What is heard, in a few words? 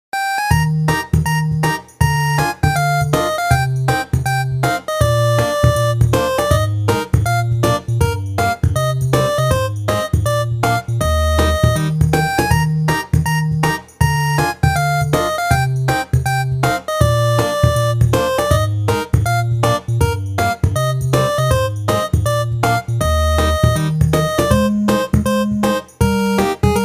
8bit Vacation